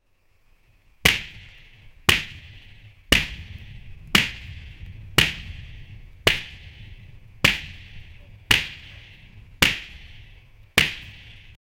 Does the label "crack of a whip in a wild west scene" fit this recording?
Yes